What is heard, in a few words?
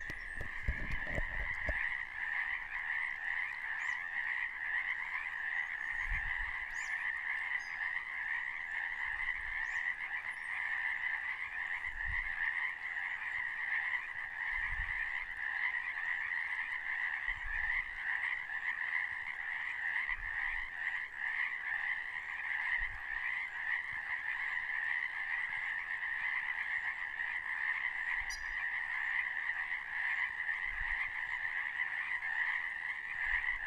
bufo borealis